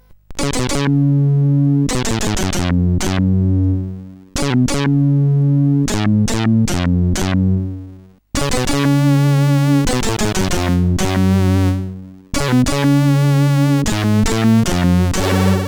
Classic Mix, CSG8580R5; Early Tunes

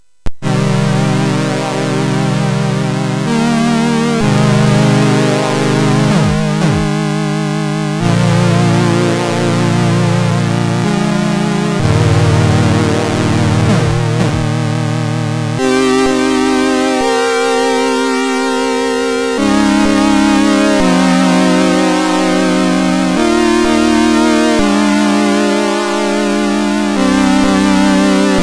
Strange Harmonies, MOS6581R3; Galleon; hvsc

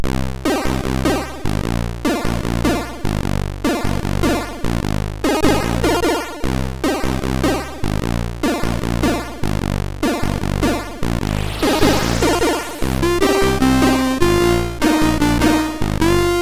Some Genetic Jazz sid MOS6581R4

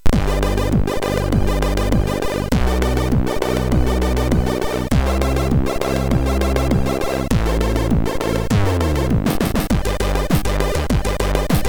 Singing Cows tune, sid MOS6581R3; HVSC

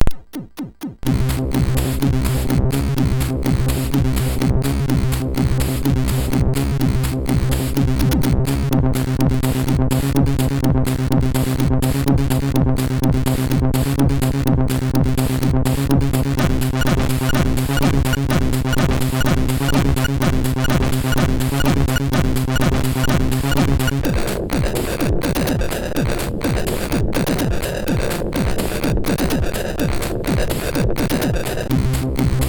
Techno Strange, MOS6581R2

